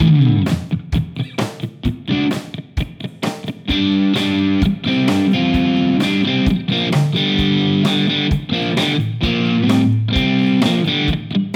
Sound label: Fender American Original 60 Jazzmaster Both Pickups